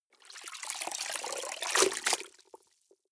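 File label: clothes water up down long, household; haus-garten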